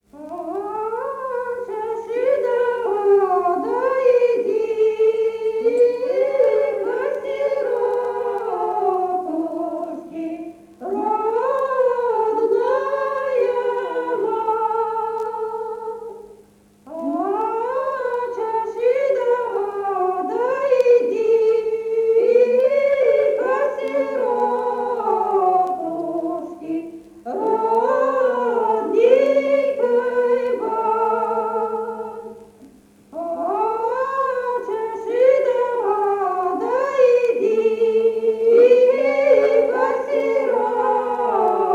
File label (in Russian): Учеши, да подойди-ка ко сиротушк, Народные песни и наигрыш Псковской области; Фестивали фольклора